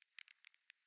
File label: DRONES